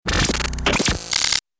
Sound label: Glitch transition whoosh cinematic short